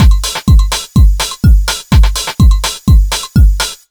125BEAT, Disco House; USB Soundscan vol.20; Fresh Disco House I; AKAI

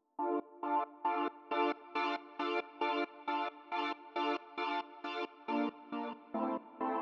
136 Pulse; Zero-G Trance Inducer; Construction Kits; 136bpm

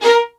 A#4, LOUD VIOLINS; Q Up Arts; Denny Jaeger Master Violin Library

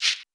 U220 FX Set, Drum Machines; Roland U220

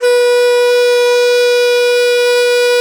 DIZI, Winds; E-MU Producer Series Vol. 7 – Old World Instruments

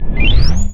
up FX, Anime Sound FX

